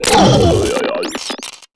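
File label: droid war dead; Star Wars Knights Of The Old Republic I; XBOX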